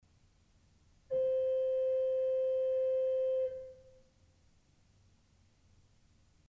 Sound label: Principal 4' C, ORGEL pipes